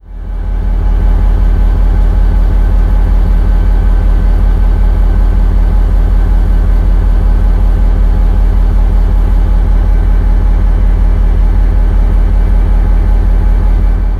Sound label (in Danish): me1537 tomgang, DSB ME 1537 tomgang; Kevin Hyllested, 2022